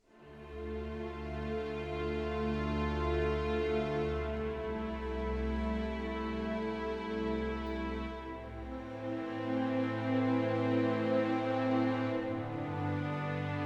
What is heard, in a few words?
Orchestral Cinematic String Phrase Chords In Key Of D Minor